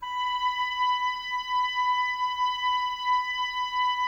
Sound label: OBOES B, Oboes, Wood Winds; Miroslav Vitous; Woodwind Brass Ensemble